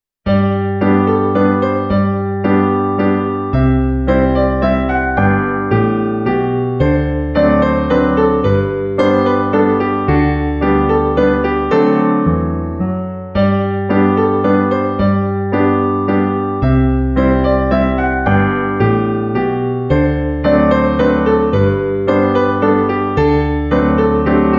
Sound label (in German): Menuett; Johann Sebastian Bach